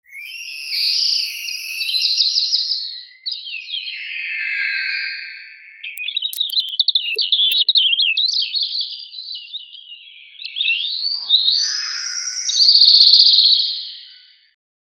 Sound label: wild magic birdish long, bird call